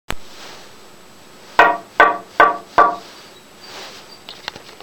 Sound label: bubinga, plates